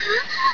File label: gasp